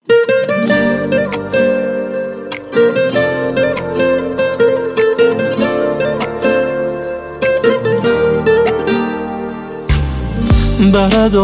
Mobile Ringtone

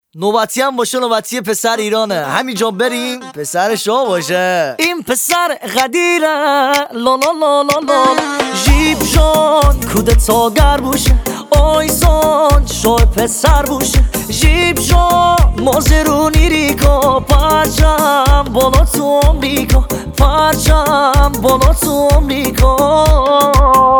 Mazani

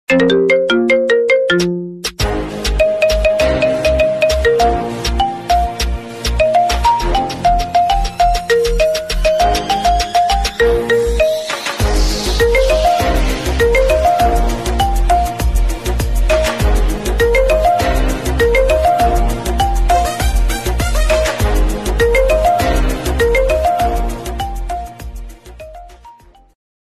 Apple Ringtone

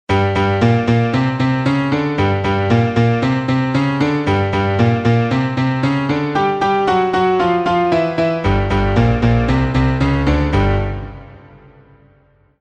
Mobile Ringtone

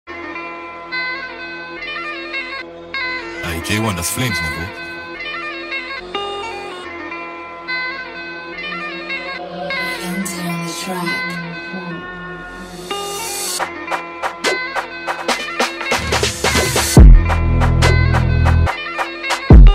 Instrumental

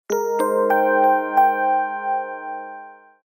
Mobile Ringtone

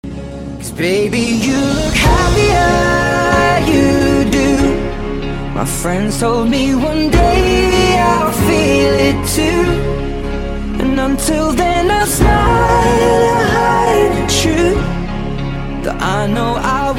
Rington Khareji, Sad Ringtone

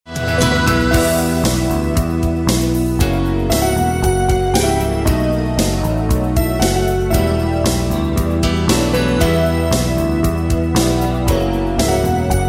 Mobile Ringtone

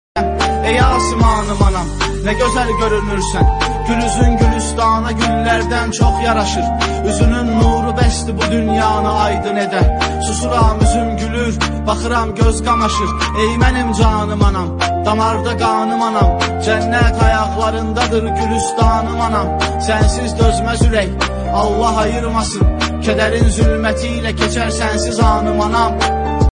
Rap Short

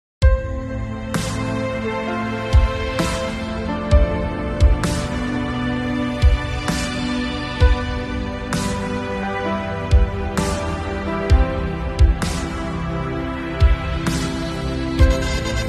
Mobile Ringtone

